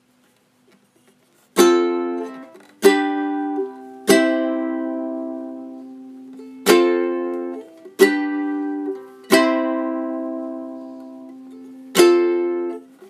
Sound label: Verse Strum Pattern, ukulele